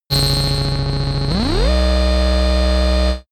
machine overload

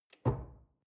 drawer large trash close door

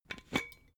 glass bottle massive open cap short

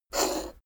poker chip flick slide table long

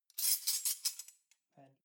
cutlery knives scrape

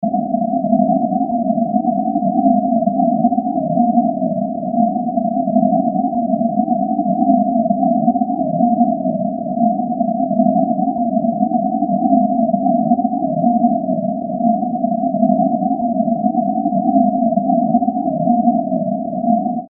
noisy hollow

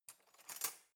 cutlery knife drawer grab soft

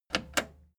plastic button slack click slow

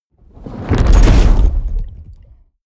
fire whoosh experimental medium

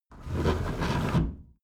window roller shutter close soft single pull

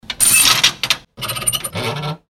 door noise

